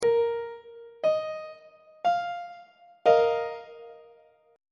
d sharp suspended 2nd 2nd inversion on treble clef